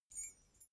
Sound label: key ring small fly tinkle